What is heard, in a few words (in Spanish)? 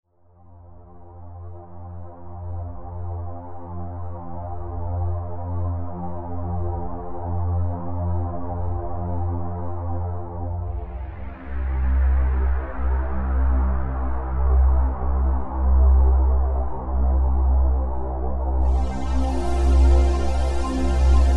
binaural fondo alpha